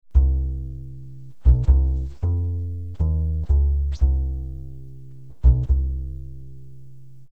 guitar

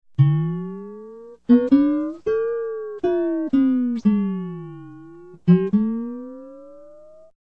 guitar

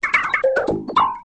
conga

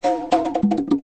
conga

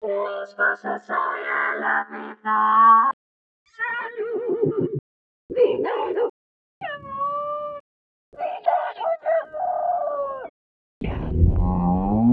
soprano farm

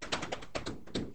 conga stochastic

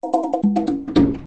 conga

